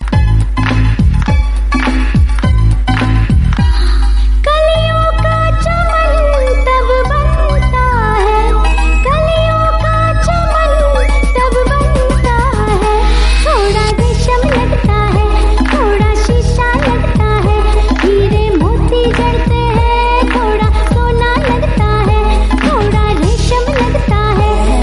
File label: Hindi Ringtone